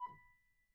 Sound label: Grand Piano, Kawai, rel B4; Chordophones; Releases